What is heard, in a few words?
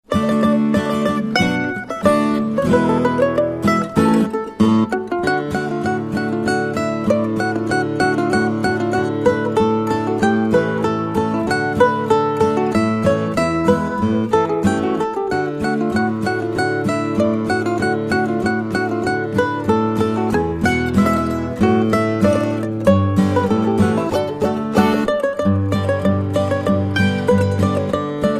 Radeszky Marsch Early; Sid Meier's Railroads